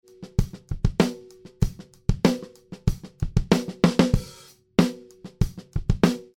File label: drums